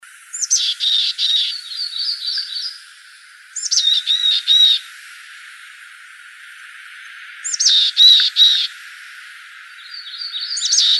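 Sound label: Mountain Chickadee calls, Chickadees Allies; MOCH; deviche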